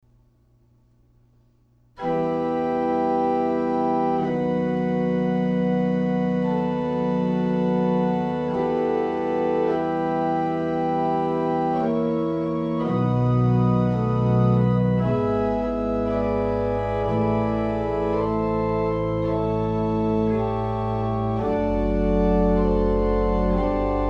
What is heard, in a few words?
Verso, organ; Zipoli